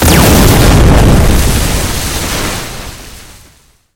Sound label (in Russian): neizbezhnij finalnij vzriv v konce igri